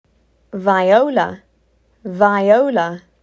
Viola

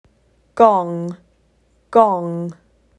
Gong

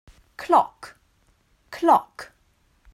Clock

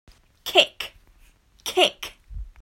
Kick

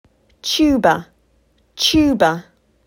Tuba

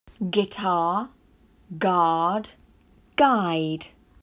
Guitar

